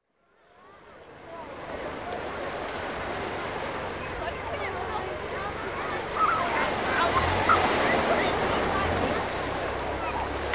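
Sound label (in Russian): Пляж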